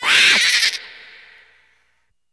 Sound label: kid die